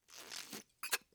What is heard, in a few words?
bottle suck